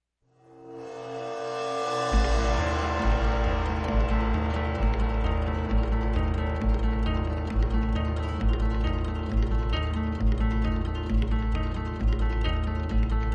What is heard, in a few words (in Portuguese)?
oficina instrumental